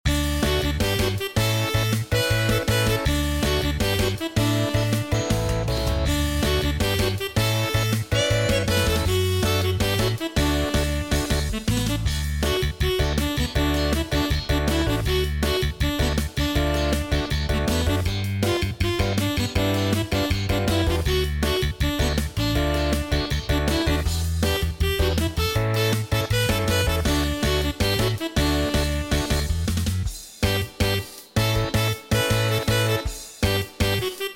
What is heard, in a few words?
Casual Loop